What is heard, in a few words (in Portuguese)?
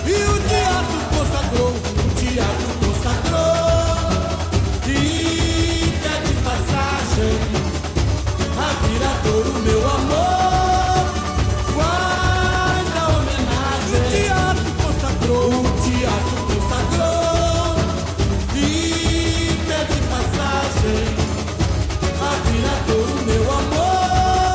SAMBA; VIRADOURO